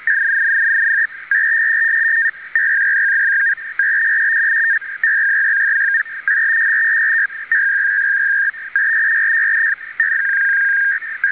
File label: PACTOR ARQ; PACTOR ist ein von Funkamateuren entwickeltes Verfahren zur Daten; Betriebsarten; PACTOR (PACTOR-1 bis PACTOR-4)